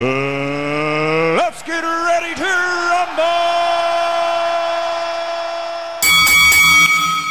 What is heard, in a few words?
rumble bell